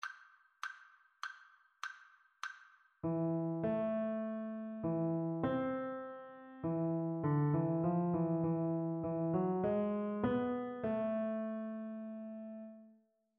partially completed melodic dictation

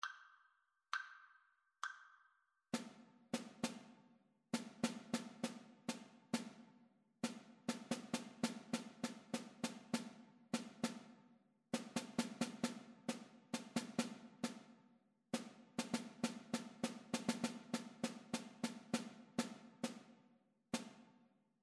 rhythmic dictation

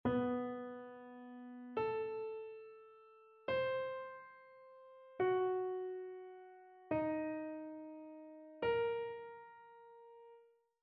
interval chains

